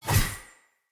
Dagger Whoosh Heavy; Weapons; Swords; Fey

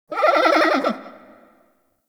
Forest Creature Night Monkey Medium; Warframe; Grineer

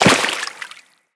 Walk Water, Footsteps; Operator

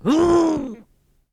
Injured Grunt

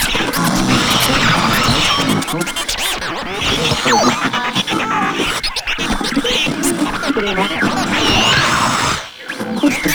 Holographic Decoy Ring Loop; Infested Lich; DJ Rom

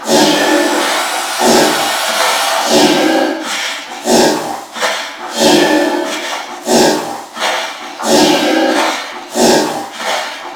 Ocean Poison Pump Loop; Ambience; Props; Warframe; Grineer Ocean

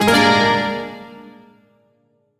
Shawzin Min Chord L; Warframe; Duviri

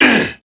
Pain, Male

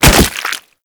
Infested Crawler Death Fall

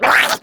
Attack Effort; Grineer Jester; Warframe; Battle Barks

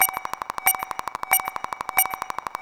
Mining Mineral Detect Loop; Eidolon Plains; Eidolon Mining Lasers; Weapons

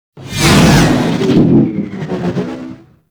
Fighter Ship Close Short Fly By; Warframe; RailJack; Corpus; Space Fighter Crp Ship Engine A

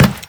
Run Metal, Footsteps; Warframe; Teshin

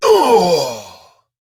Death; Warframe; Dax Ashigaru; Battle Barks

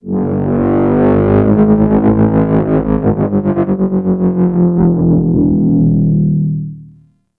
Terraforming Egg Vocal Dry; Warframe; Tau

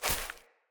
Grunt Step Veg, Footsteps; Enemy; Characters; Corrupted Sproutfolk

